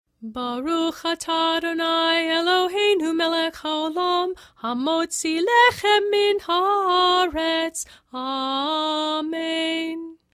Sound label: Hamotzi Chanted; Cantor; concluding service